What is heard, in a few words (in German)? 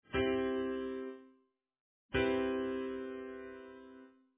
Tonika Septim Akkord